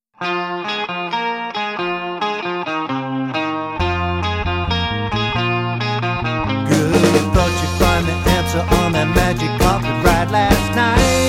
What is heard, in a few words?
classic rock band